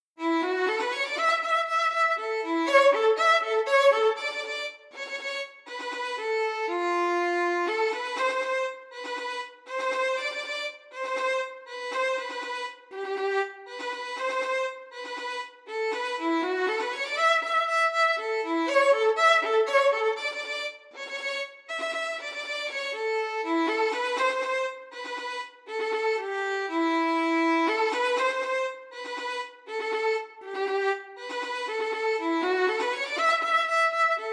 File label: Flutey Arranged for Violin; Castle Crashers